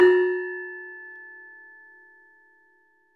LAMELS F#3-L; USB Soundscan vol.60; AKAI; LAMELOFO

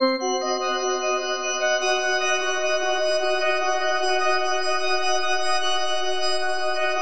FM C DIM; Bolder Sounds

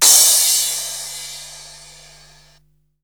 CYMBALS, Disco House

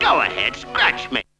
toon; Zero G - Man Machine

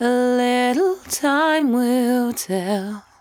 74-5bpm B, Velvet Vocal; While U Could; Zero-G

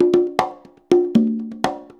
120 CONGAS, Percussion Loops

